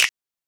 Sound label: Snap